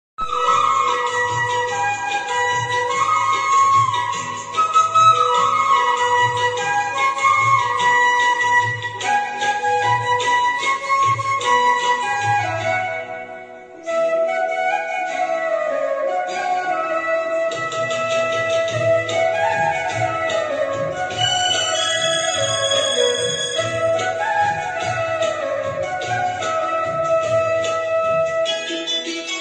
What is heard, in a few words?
flute flutemusic music instrumental ringtone